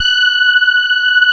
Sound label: STRIA, Twisted Textures; STRYNGI